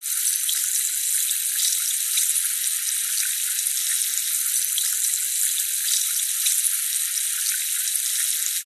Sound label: food drink sparkling water fizz